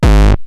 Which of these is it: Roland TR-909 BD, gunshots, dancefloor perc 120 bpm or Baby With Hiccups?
Roland TR-909 BD